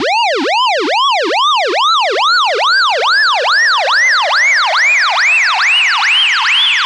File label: Riddim Riser; One Shots; BadBoi; Ghosthack; Riddim Essentials 2020